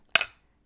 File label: wooden piece falling on wooden floor, A small wooden toy falling on a wooden floor (recorded with Tascam DR 40).